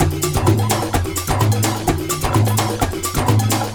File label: Latin House Loop 128 BPM; Vengeance Samples